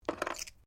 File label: COUNTERS PLASTIC GAME PICKUP; Casino Sound Effects